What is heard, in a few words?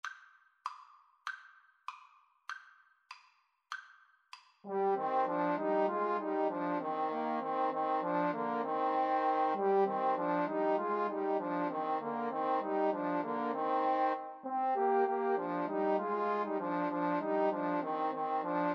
huron carol, Trombone Trio